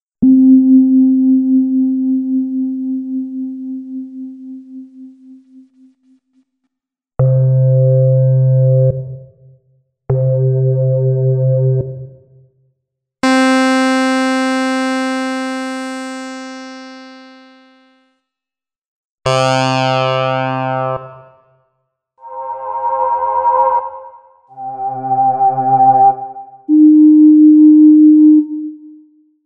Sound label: tape